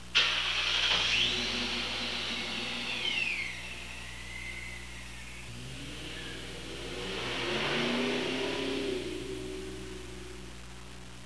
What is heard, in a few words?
drive off; '98 Procharged GT; Drive Off 2; The exhaust is stock with a set of 2-chambered delta-flow flowmasters.